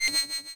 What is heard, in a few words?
deadly dash; action RPG game; Adventures in Lestoria; Sig Productions